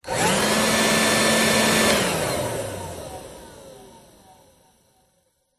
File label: household electric leafblower short burst